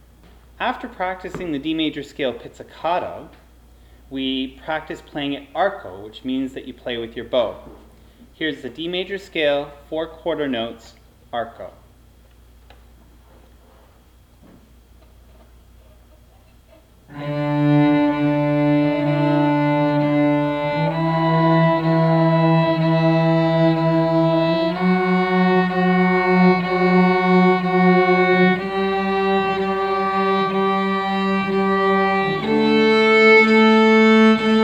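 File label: D Major Scale, Strings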